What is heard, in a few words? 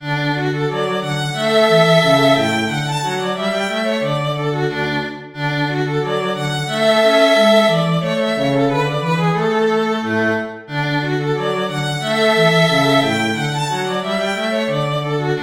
violin cello duet